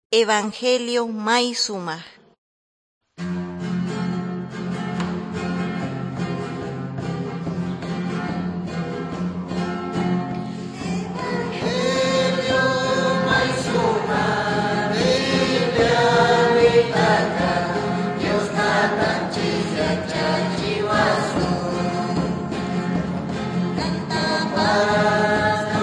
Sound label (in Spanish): songs quechua norte de bolivia apolo